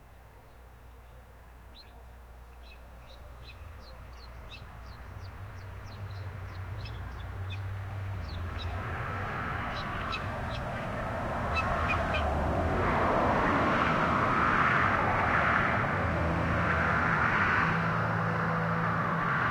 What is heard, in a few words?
a duck crossing several, accident